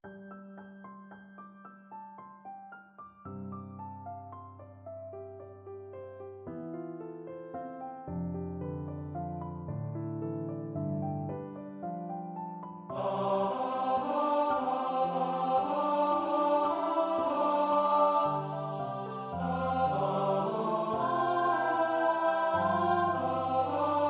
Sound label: marriage morning tenor, choral